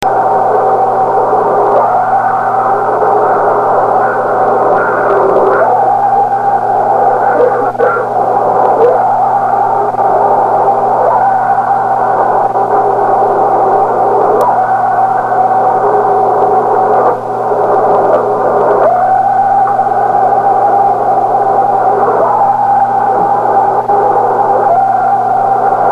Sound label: screams